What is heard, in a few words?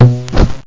ketch; CD Action 12 A